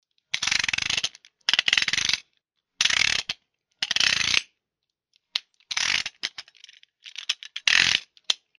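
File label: utility knife sound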